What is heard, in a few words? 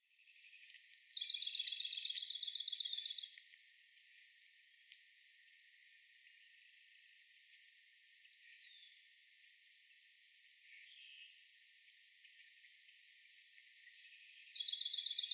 Swamp Sparrow